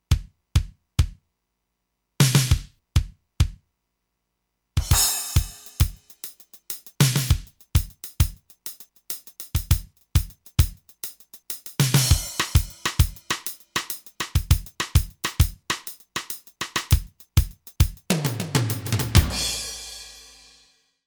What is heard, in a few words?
LA Powerdrums